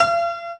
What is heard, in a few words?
Beat